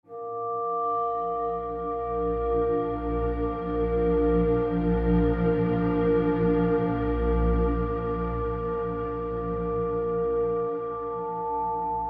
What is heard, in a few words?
Explore Day Pad chords; horizon zero dawn